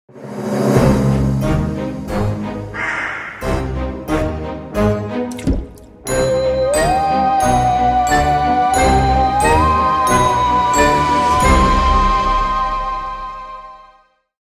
Strange Werewolf

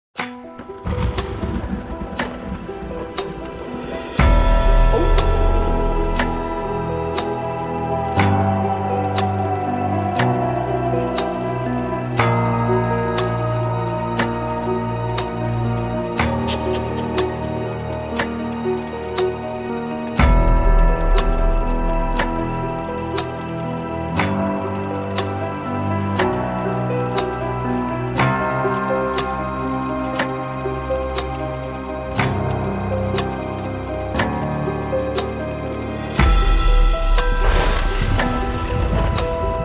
Creepy Halloween

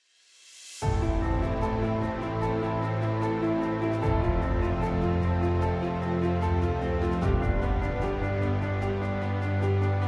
The Epic Dramatic

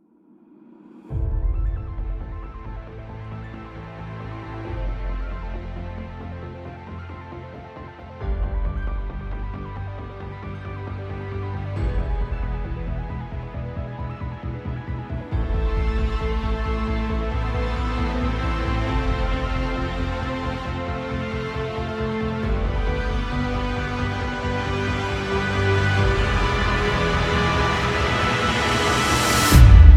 The Epic Trailer Sci Fi Powerful

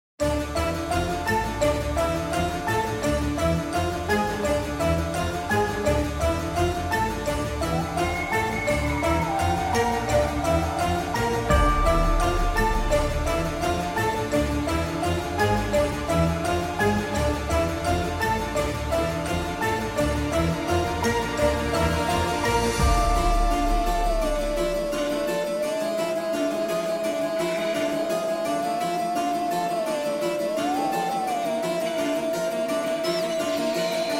Epic Halloween

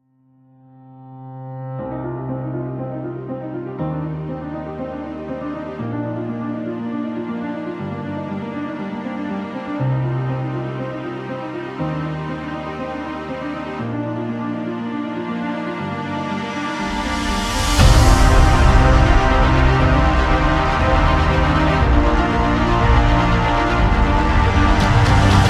Epic Trailer Motivation

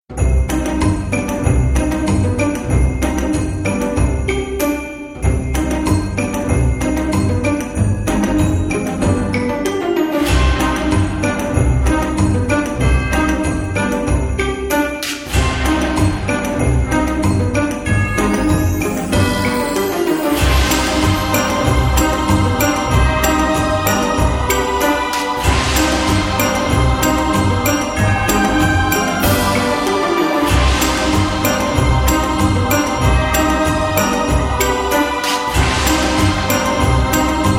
Holiday Christmas Storys Comedy

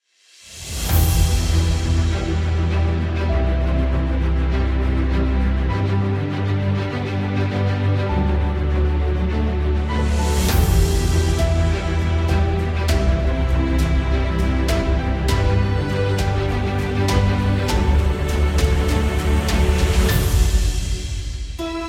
Epic Music Cinematic Inspiration Upbeat

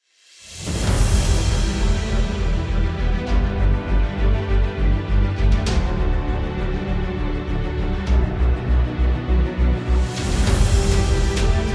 Cinematic Dramatic Epic Inspiring

